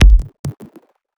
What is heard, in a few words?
Lat, Kik, One Shots; Sonic Foundry (Sony Creative Software) - Future Retro Dance Excursions